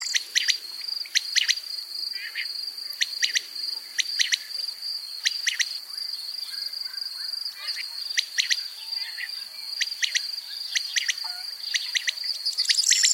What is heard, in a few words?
Quail Sound